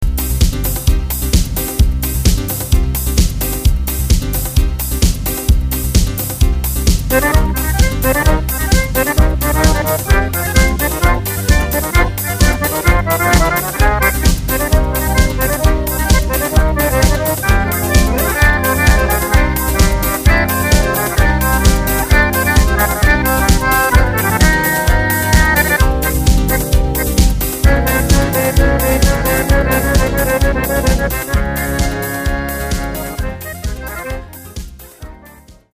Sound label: Salsa, accordion